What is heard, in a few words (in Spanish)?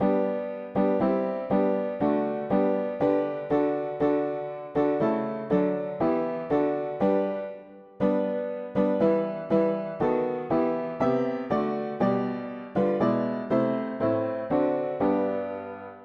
mono piano; hymns; Himnario pequeno